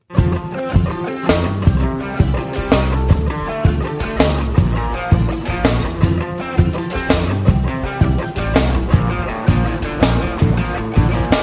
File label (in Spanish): bateria y bajo